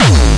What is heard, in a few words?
obnoxious kick